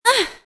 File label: Ugh; Krystal